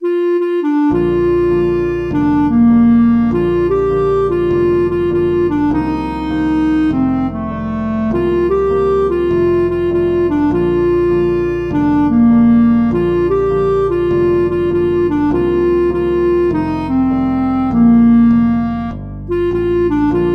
clarinet audio; he's got the whole world in his hands

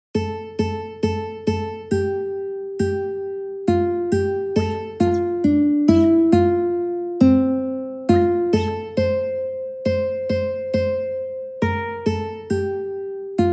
waltzing matilda ukulele

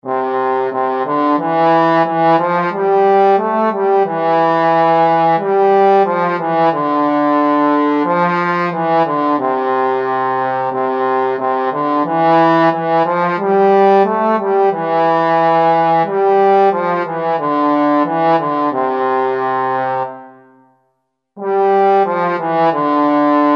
long long ago trombone

